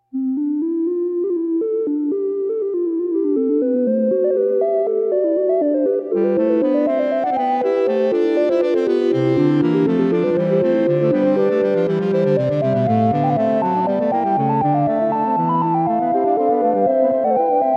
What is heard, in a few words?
bach fugue; analog synth; ultimate expander